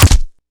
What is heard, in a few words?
body hit; player